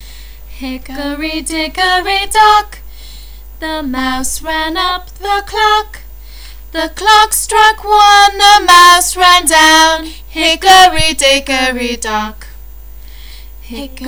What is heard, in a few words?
Comptine Hickery Dock